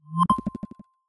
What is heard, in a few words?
arrow; Samsung Galaxy bildirim sesleri